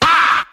"ah", male

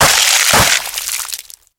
bone break evicerate

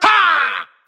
"ha", male; ooh ahh

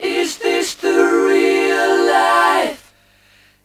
SUNG; questions

